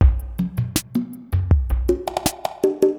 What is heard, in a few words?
DRUM LOOP ambient 160